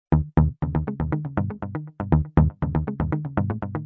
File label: fun echo guitar loop; GUITAR LOOPS